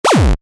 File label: Retro SFX, Retro Style, Harsh, Heavy; Tech (Sound Effects:Science Fiction)